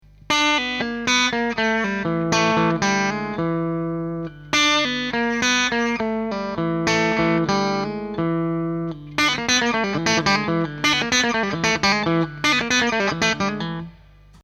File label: Lick Bluesy